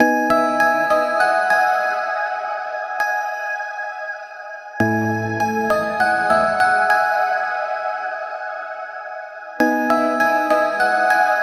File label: Bell pluck, chord progression, top catchy melody, E major, high reverb, 8 bars, 100BPM; Audialab EDM Elements